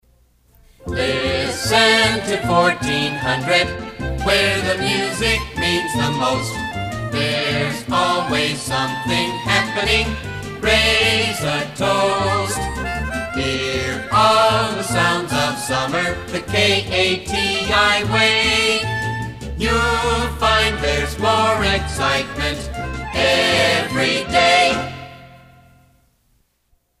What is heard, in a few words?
College Song Style Jingle; KATI